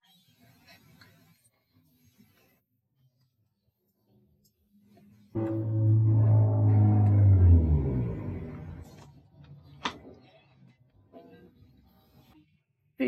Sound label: car